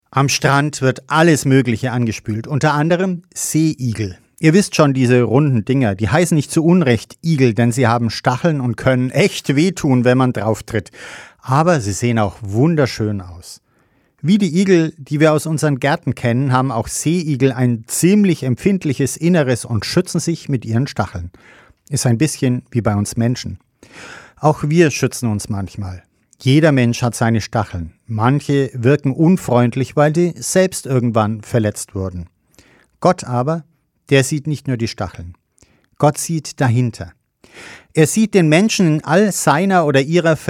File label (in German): gong